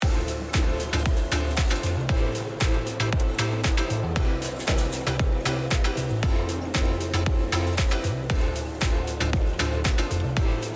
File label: Main Lobby BGM